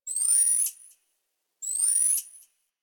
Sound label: Night Zone Tree Critters; Warframe; Ambience; Eidolon Plains; One Offs